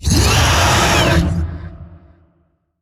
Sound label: Vocal Pain; Warframe; Zariman; Void Angel